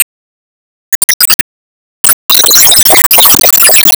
Enemy Evolution Active Transform; Disney Star Smash; Android, iOS, Mobile

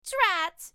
Time Over

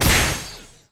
Player Pain Damage

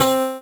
Synth; Senpai

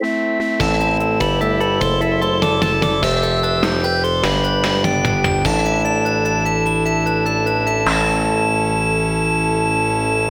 Final Stage Complete (FM)

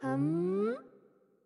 Wind Up; Winkubus

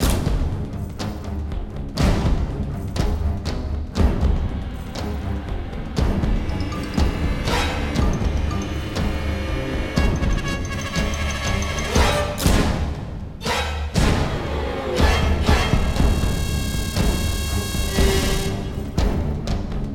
Boss combat; The Hobbit; PS2

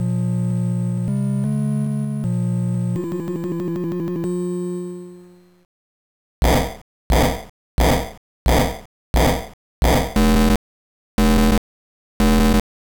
Ladder tune

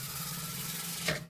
Snare Buildup; Lethal Company

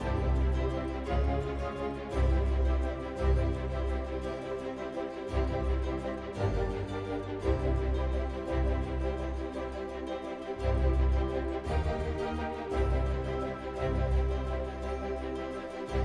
85bpm 3-8meter; Planet of Lana; Tailo Village B

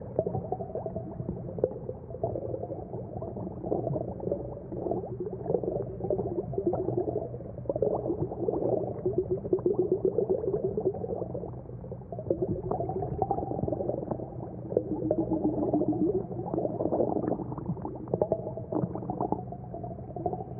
bubbles; black book

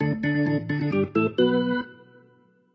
Organ; Fourth of July 2019

